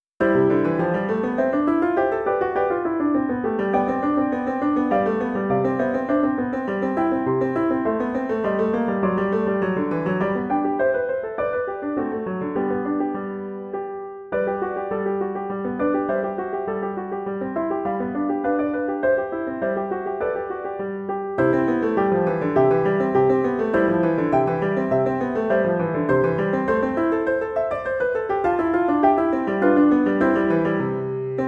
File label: Duo Vl Vla; Hoffmeister; Op.13-2